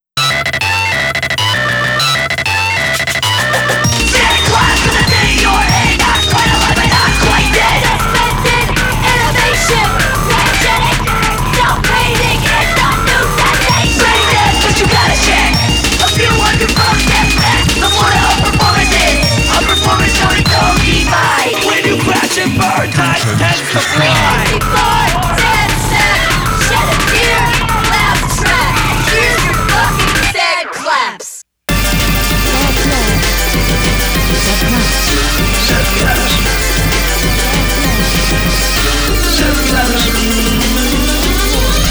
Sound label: Sad Claps